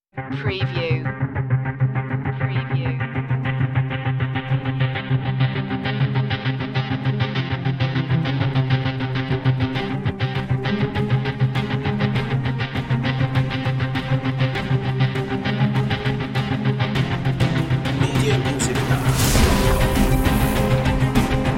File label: eastern tension dubstep no sitar